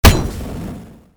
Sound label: attack impact; Li Li Shadowpaw Sound Effects; Li Li Heroes of the Storm Responses; warcraft